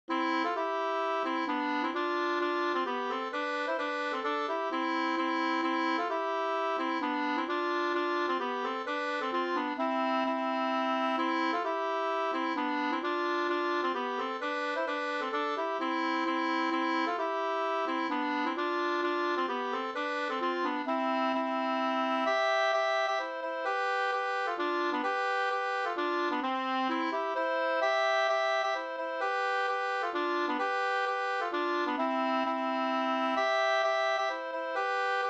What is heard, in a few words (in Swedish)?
hambopolska, 2st; Hall dig val Up